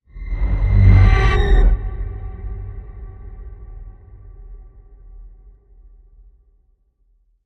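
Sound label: Whoosh Giant Hummingbird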